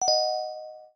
spirit, notifications; OnePlus 5T Stock Ringtones

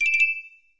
Clank; Mi Mix 2S Stock Ringtones

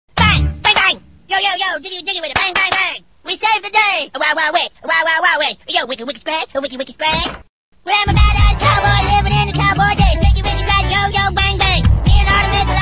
yoyo bang bang ringtone, Funny Ringtones

